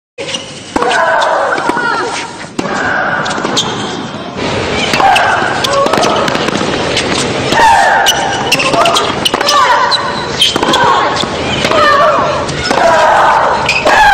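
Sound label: female tennis players' shouts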